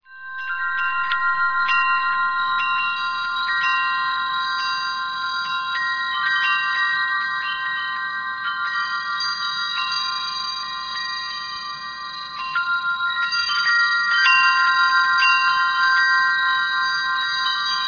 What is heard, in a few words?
windchime